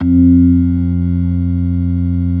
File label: ORG. F2; B3 FULL